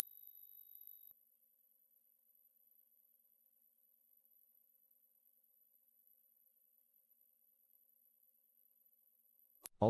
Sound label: dog whistle